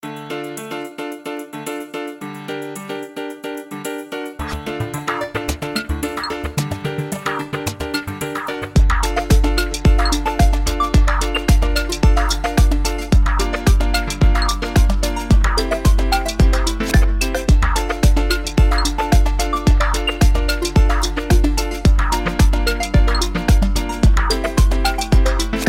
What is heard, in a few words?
House, ringtones